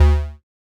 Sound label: Allert Computer; FREE SFX PACK VOL 2